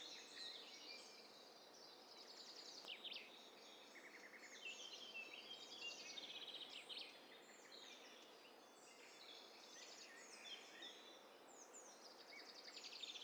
STEREO BIRD COCKTAIL PARTY

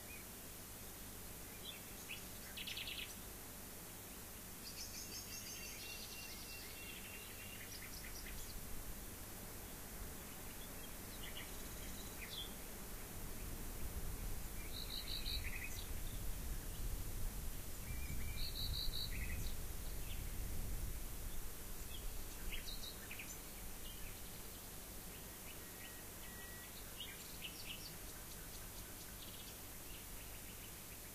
rayol farm; SEAMED

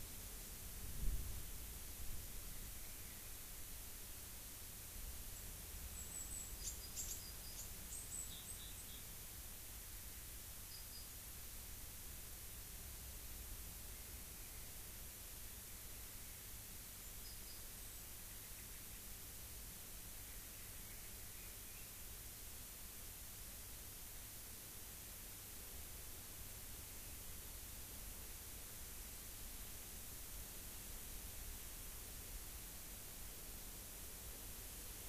rayol farm; SEAMED

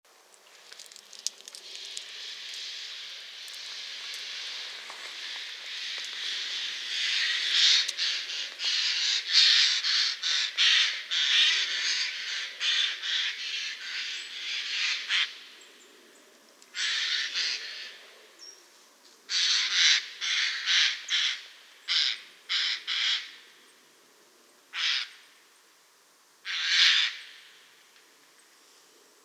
Garrulus glandarius; SETE

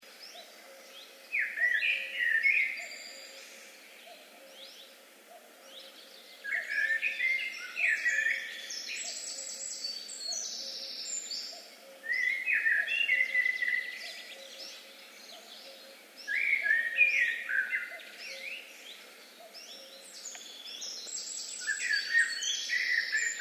Turdus merula; SETE

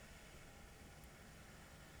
pulses, BALAENOPTERA; MONACO 2022-07

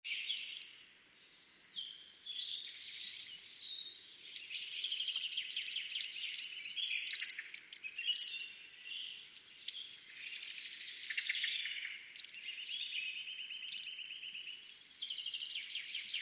Sitta europaea; SETE

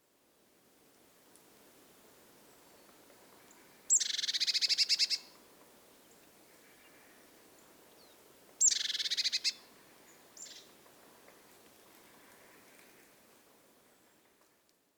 Cyanistes caeruleus; SETE

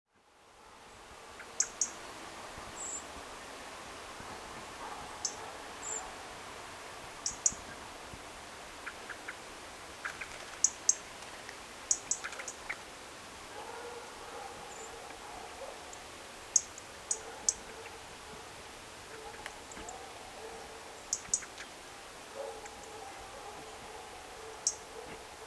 Erithacus rubecula; SETE

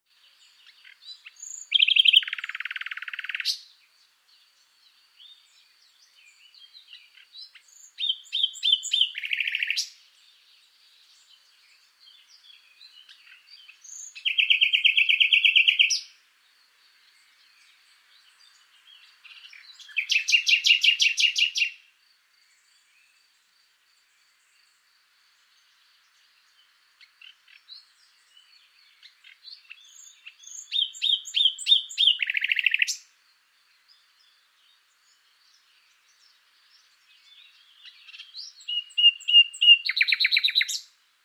Luscinia megarhynchos; SETE

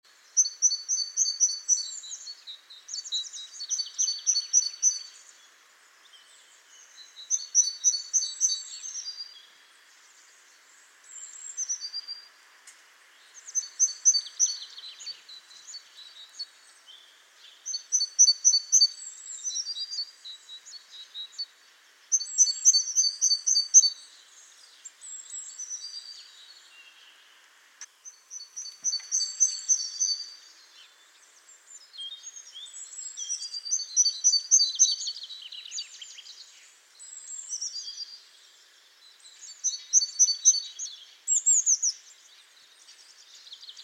Parus major; SETE

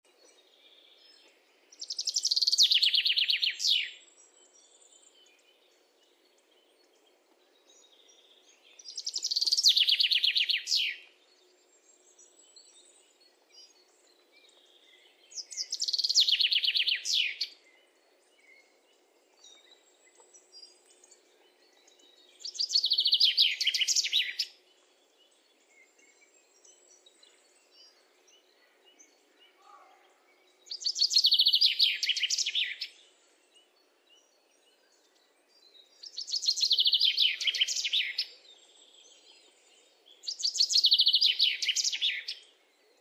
Fringilla coelebs; SETE

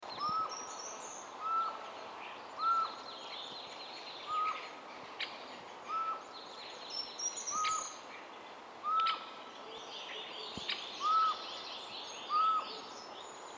Garrulus glandarius; SETE

